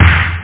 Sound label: drums snare; OctaMED SoundStudio V1